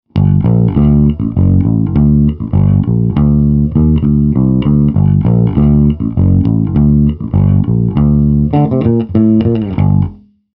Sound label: Mayones Jabba 4